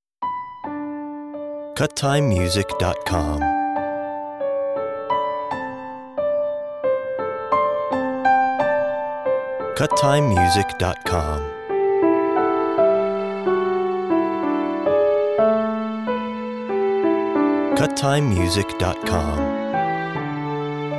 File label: orchestral lullaby